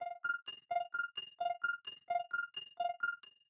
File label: piano pan